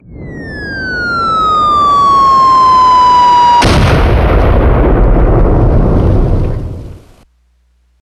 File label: Bomb dropping